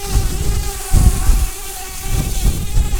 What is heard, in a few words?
bumblebees, Pascuorum queens